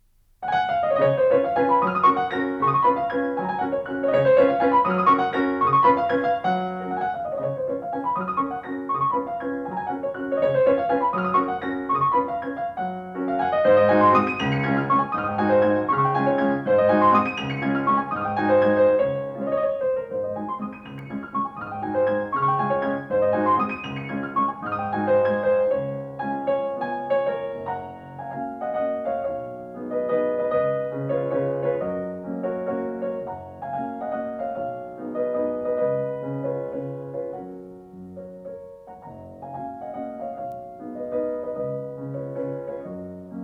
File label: Valse Nr 11 in G♭ major Op 70 1, Piano; Chopin; Dinu Lipatti